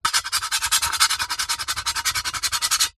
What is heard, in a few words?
foley metal aerosol can scratch sharp object